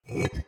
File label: Blink Damped